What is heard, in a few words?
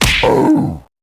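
free spins grunt hit heavy; wild worlds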